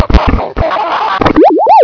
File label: birth, gonarch; svencoop; cracklife